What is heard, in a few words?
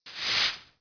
sweep